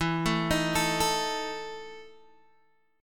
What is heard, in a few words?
E Major 7th Suspended 4th, Guitar, Open D; chord shape